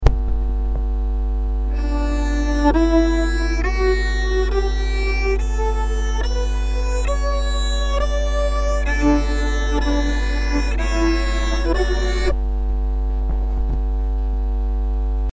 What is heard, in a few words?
piezo; bow